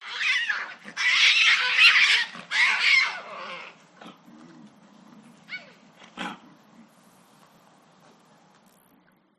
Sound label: Lynx; audio hero